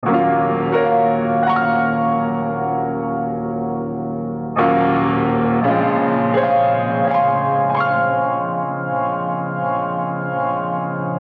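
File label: 172 A sharp Synth Piano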